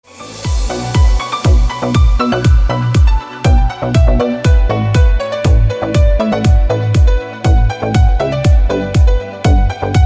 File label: piano